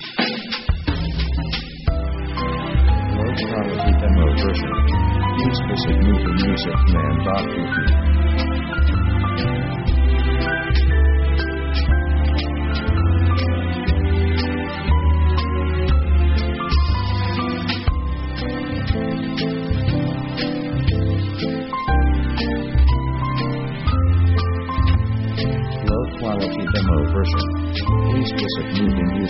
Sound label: easy jazz orchestra